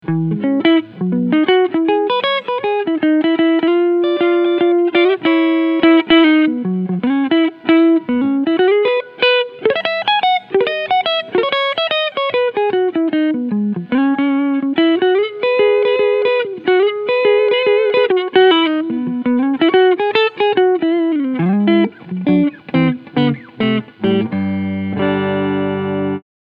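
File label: Cleansound Front PU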